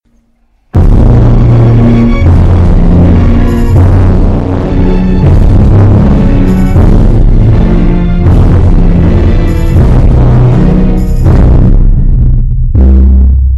theme bass boosted; kira yoshikage

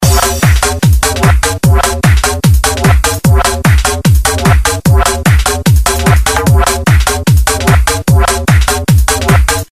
hard bass

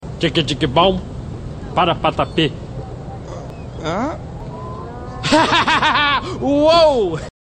hahaha

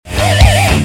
squeel

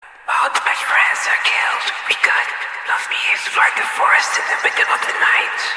horror mode party club

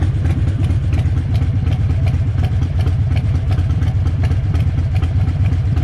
purring like a kitten

